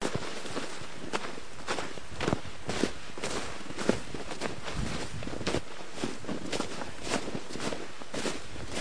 crunching in snow, looping ambience

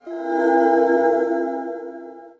Layered Vox, female layered synth vox

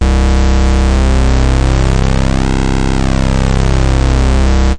202 wid, synth; 202 synth construction kit

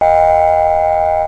narrow filt; Pads

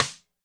cross stick sd, snare; single hits

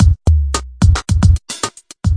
110 double tone bouncer, drum loops and breaks; 101 to 110 bpm

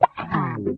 wah down, Guitars

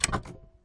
friction down, Typewriter

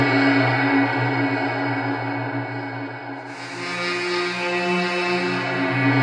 two tone pad loop; LOOPING AMBIENCE

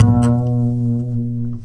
Upright Bass Notes

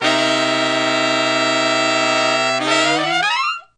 dissonant horn hit; HORNS SAX TRUMPET BRASS

